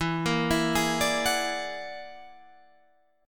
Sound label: E 9th Flat 5th E down